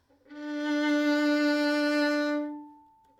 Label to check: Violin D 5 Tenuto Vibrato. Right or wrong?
Right